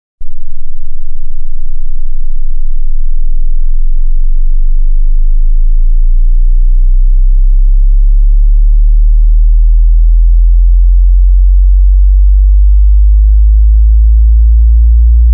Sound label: 10 Hz - 200 Hz